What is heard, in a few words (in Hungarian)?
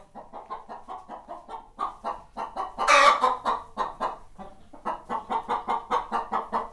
kotkoda, magyar tyúk; parasztudvar